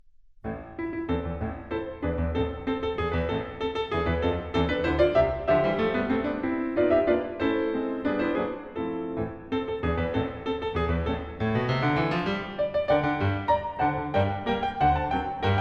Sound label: Allegro, Piano; Martinů